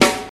Funk Snare Single Hit F# Key, snare one shot tuned to the F# note; 70s